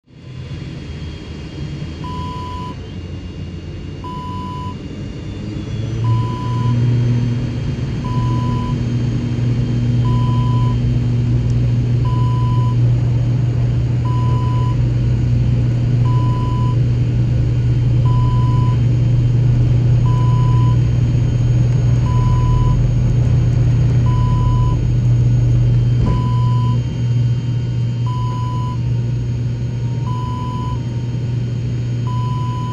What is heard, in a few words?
airplane interior